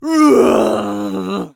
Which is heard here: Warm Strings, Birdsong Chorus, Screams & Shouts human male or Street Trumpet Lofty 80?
Screams & Shouts human male